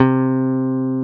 C3, BASSES, Roland

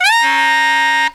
SAX, Killer Brass Riffs

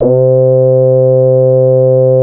Anasound Long mid C, Analog Single Notes; Sonic Foundry (Sony Creative Software)

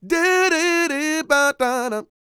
SING, Vocal Planet R&B & Dance; ILIO

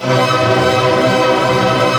ARP F# MAJ FF, STR ARP; Sound On Sound; Peter Siedleczek's Orchestra

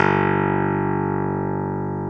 KEY X Hohner Clavinet; Club-50 - Foundations Roland; Clavinets

